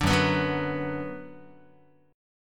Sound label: F sharp Suspended 4th Sharp 5th Guitar, chord; strummed; F#sus4#5